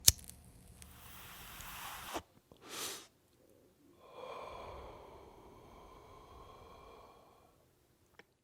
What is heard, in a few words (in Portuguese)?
maconha